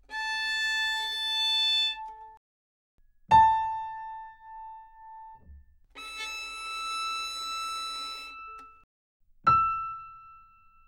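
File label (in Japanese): ヴァイオリン フルート; Pf; 擦弦; 弦鳴楽器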